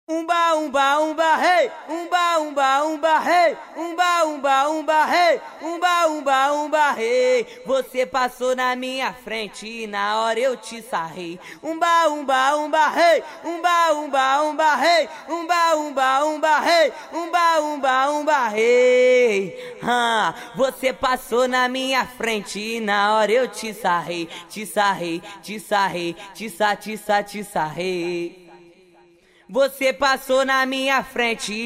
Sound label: ACAPELLA